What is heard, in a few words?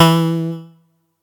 atari 520 st